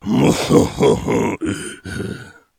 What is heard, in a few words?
man